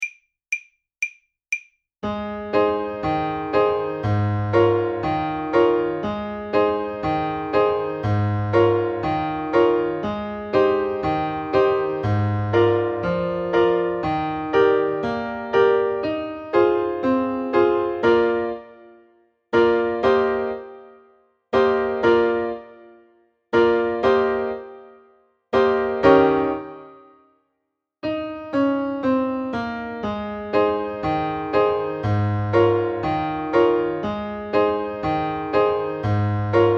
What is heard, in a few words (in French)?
danse clown, saxophone